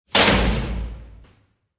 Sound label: trapdoor Drop